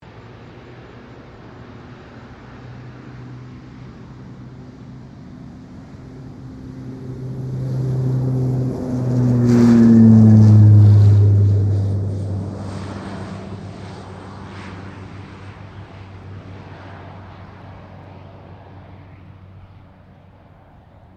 King Air 350 takeoff, stereo; Airplanes; Vehicles

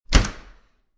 Door Close, Metal Door; Household; Doors

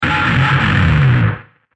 Horror Decay Industrial Rock; Scary Soundscape Sound Effects

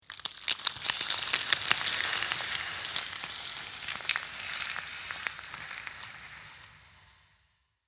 Dragon Hatching Eggs, monster; horror

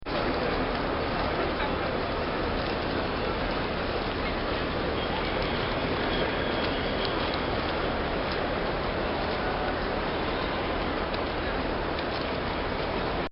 Grand Central Station, Transportation; Backgrounds; Bobby Cole

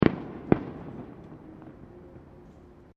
Fireworks Multiple Firecrackers; Backgrounds; CraftedSignals

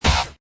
Hi Tech Panel Servo Button

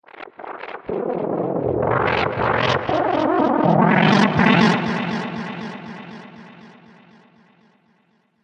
Chaotic Electronic Wind, whoosh; Whoosh Sound Effects

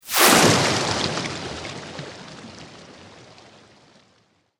Sweep Organic Medium; Created sweeps, swooshes and other movement effects; Sweeper Sound Effects; Sweepers (Sound Effects:Sound Design)

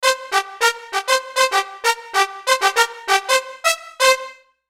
Musical Brass, Musical Brass Melody Syncopation; Blastwave; Sound Effects:Musical

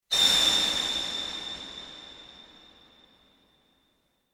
Scream Flashback Vocal Flash Scary Haunted; Flashback Vocal Scream Flash Scary Haunted Piercing; Horror Transition; Evil Voices

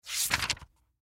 Textbook Turn Page, Textbook Turn Page Fast; Books; Offices; Blastwave; Foley

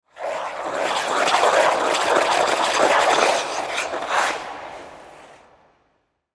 Ice Skate Spin, Ice Skate Spin Twirl Rink; Ice Skating; Sports; Ice Skating (Sound Effects:Sports); Blastwave

